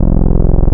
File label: VCO, wavetables; Animoog